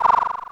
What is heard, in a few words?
Propeller Suit Spin